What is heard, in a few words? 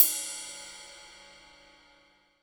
XV Ride, Roland XV-5080